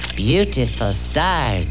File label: death; alienarena; johnny5